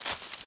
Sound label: weed step; Golden Crayon Sounds